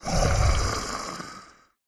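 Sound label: lizard shaman stun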